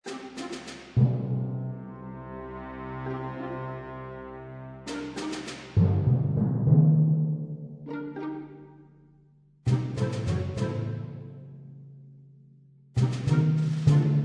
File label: Combat Planning Music; Boom Beach